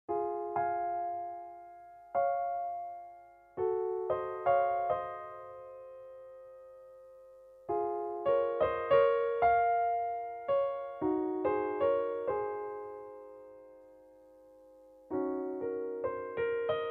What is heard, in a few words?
concert grand piano; clair de lune prelude claude debussy